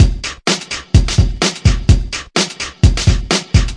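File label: 127 bpm leaky cauldron, drum loops and breaks; 121 to 130 bpm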